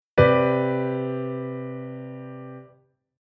Chord Hybrid; Jissen

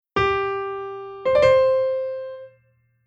grace note

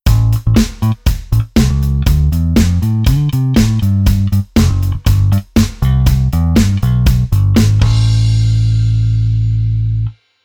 Bass Arr Oct All

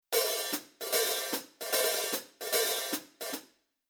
Rhythm Basic Velo HH Ride

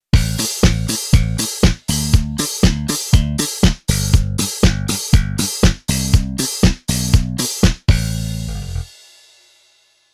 Bass Disco

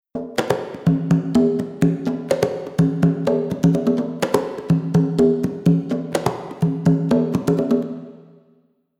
Reverb Plate

